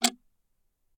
Clock Tick Clock Ticking Single; Clocks; InspectorJ; Essentials 02

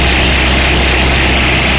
spin loop, spin trap; hl2

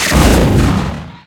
launch; physcannon; weapons; hl2

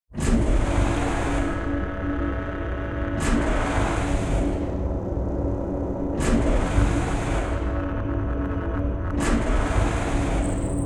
Spaces Generator; SoundMorph

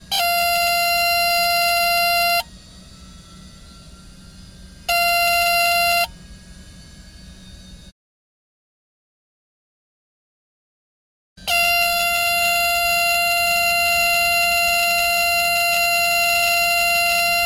Beechcraft Baron 58 - Stall Warning; Pole Position; Beechcraft Baron 58 1969; VAR SFX; MS Decoded

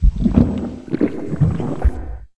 hydra heart loop; hl2

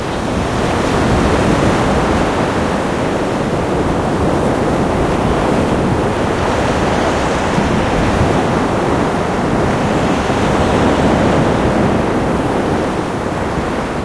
outside ship dull; hl2; borealis

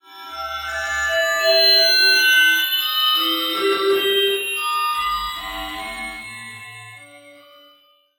chime texture, Scary Ambiences; Bluezone; Scary Ambiences and Sound Effects

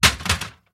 Drop Fall Metal Rattle Scrap Debris; METLCrsh; Mechanical Wave; Torturing Metal

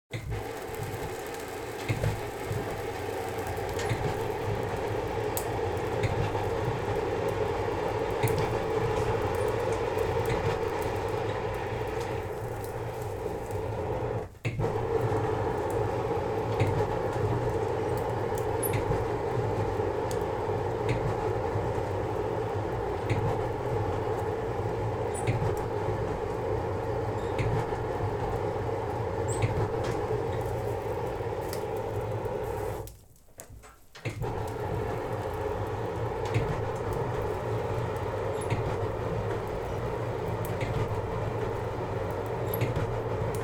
Smithy, Bellows, Fire, oven accent, MEDIEVAL; Mononeshot

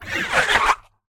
Creature Voice Dry, Hidden Voices Bodyboard; InspectorJ

